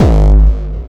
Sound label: hardbassdrumz, breakcore; Bazzdrumz